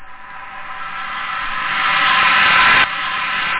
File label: swish, whoosh; da capo vol1